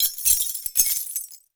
Crashes Glass Debris On Concrete Floor

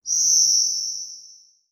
Multimedia Internet CD-Rom Flash High Pitch

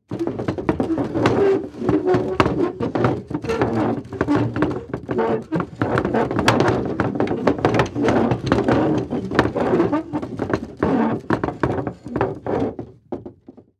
Household Chair Scooting On Floor

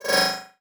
Multimedia Internet CD-Rom Flash Element

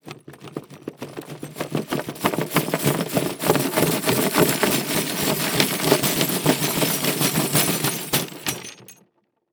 Impact Earthquake; Impacts

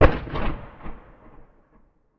Weapon Cannon Fire; AdobeCC

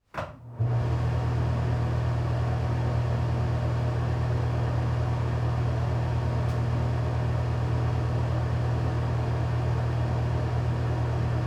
Fan Run In Bathroom; household

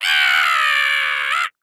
Cartoon Human Animal Howl

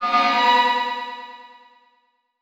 Title Transition Delay Short; Adobe; Production Element

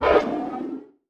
Multimedia Internet CD-Rom Flash Whoosh

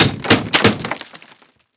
Impact Wood Hits And Debris

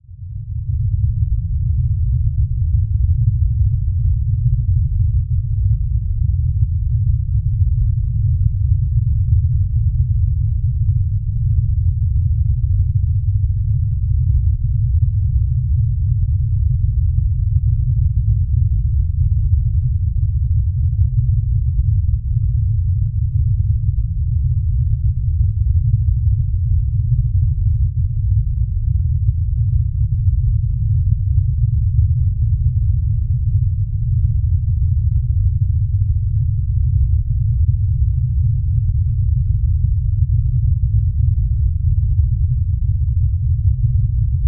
Weather Earthquake Rumble; AdobeCC

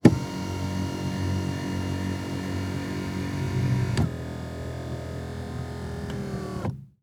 Transportation Car Monte Carlo Adjust Seat

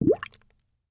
Liquid Water Water Bubbling Once

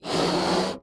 Cartoon Balloon Being Blown Up

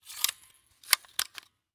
Industry Tool Staple Gun Close And Lock Chamber

